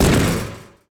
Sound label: bleacher fall; Golden Crayon Sounds